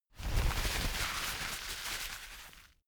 paper towel roll sheet crumple

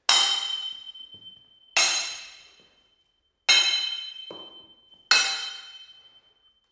sword hit sword sequence

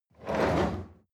chair wheel roll on floor hard

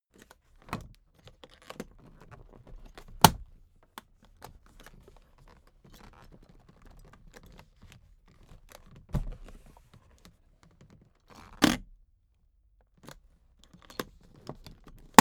suitcase handling

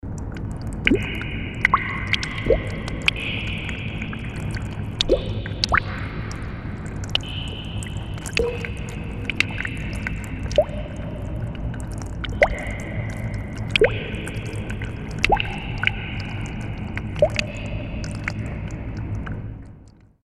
cave atmo

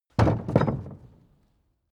carriage hatch single

